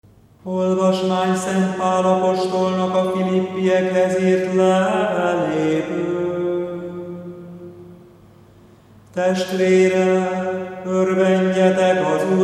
Gregorian chants; Advent 1; Adventi enekek

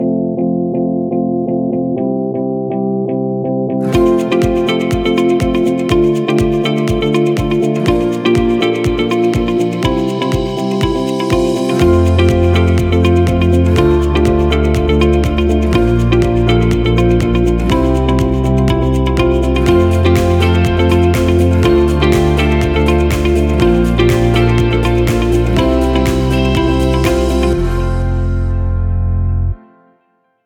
Uplifting Forward Thinking; Uj szignal zenek Várad

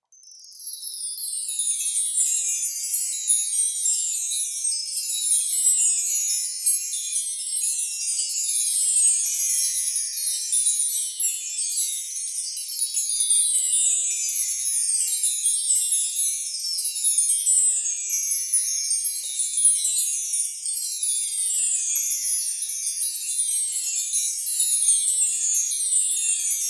long descending magic chimes; Harang csengo

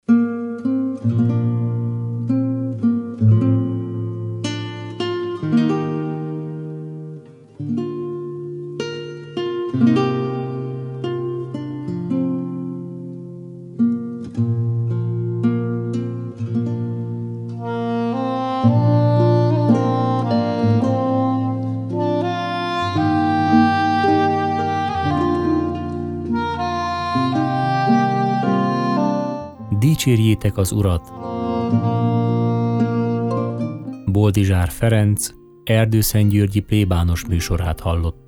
Jingle; Dicserjetek az Urat

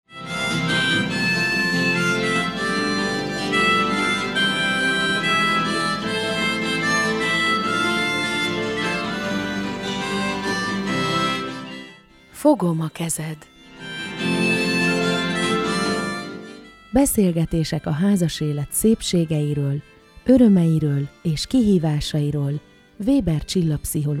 Jingle; Fogom a kezed

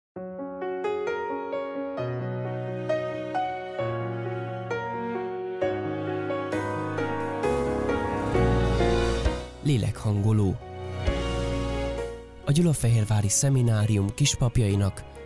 Jingle; Lelekhangolo; STUDIO GYULAFEHERVAR

